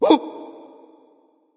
grunt hurt; smite; voicepack; hun batz

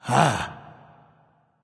"ah", discover; smite; voicepack; ullr

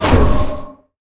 air disc; smite; kukulkan

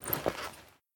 Armor; smite; raijin

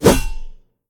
swing; smite; gilgamesh

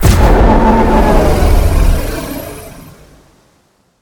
bear activate; Smite; Baron Samedi

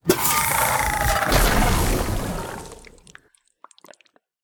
scorpion death; smite; npc

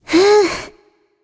breath; smite; voicepack; scylla

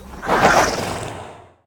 chariot horse breathe; smite; Apollo

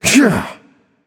grunt attack; Smite; baronsamedi

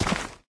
step; smite; chronos

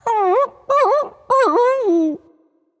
growls

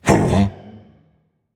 notice

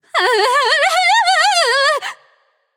fear, voicepack; smite; pele

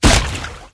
intro land; smite; poseidon

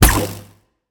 bounce; smite; god raijin

